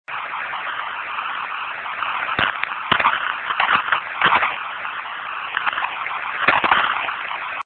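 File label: rustle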